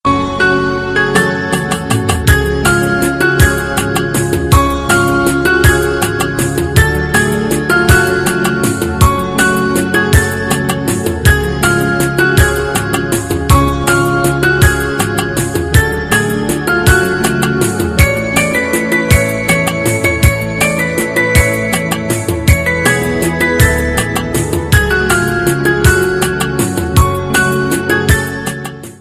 instrumental ringtone

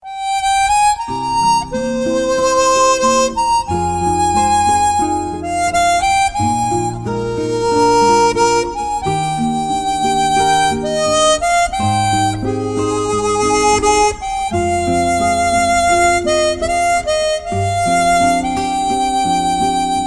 simple sad music ringtone

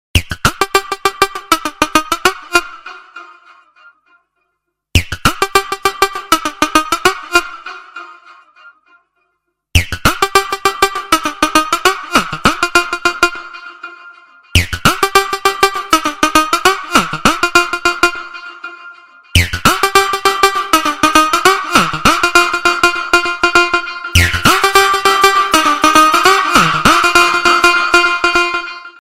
nokia nasal ringtone

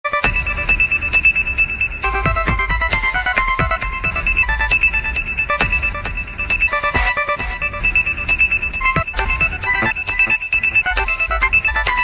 lg x4 original ringtone

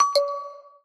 fade in ringtone, sms

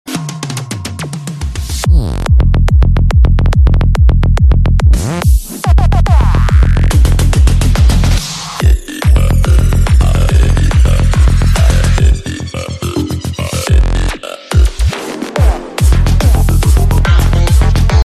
robot mix; devil

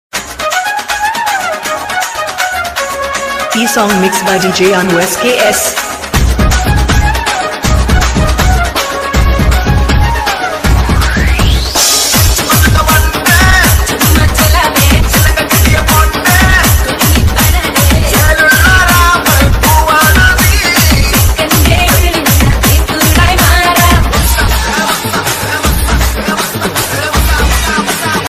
dj remix music ringtone

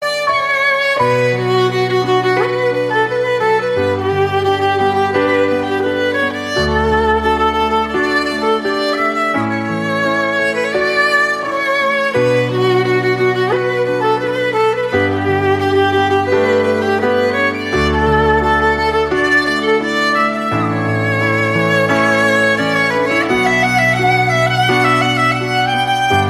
flute instrumental, ringtone

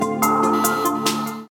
hitech notification ringtone; for android

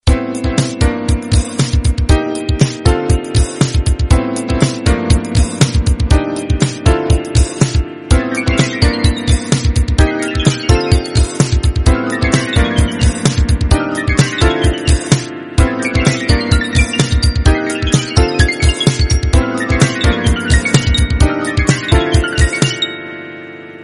smooth magical music tone; nokia ringtones

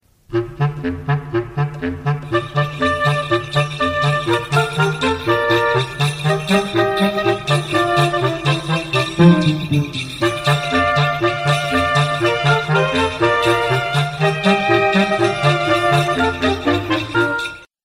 jingle bells cart ringtone

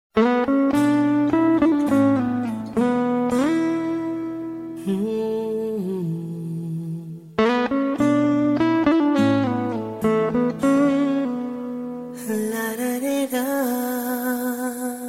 nice music ringtone

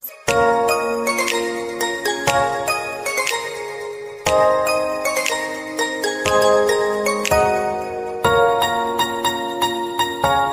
romantic music ringtone, bollywood

